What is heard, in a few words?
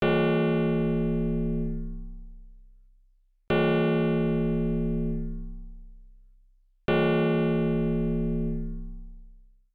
Gong; Mortal Kombat 3; gen smd 1995